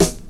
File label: nice steel snare drum f sharp key